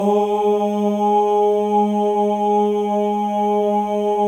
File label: male ohs; Spectrasonics; Symphony of Voices